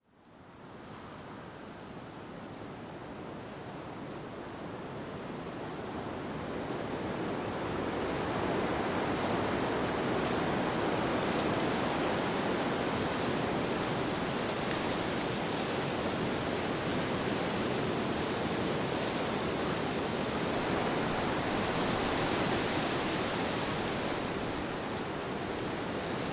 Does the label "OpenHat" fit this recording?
No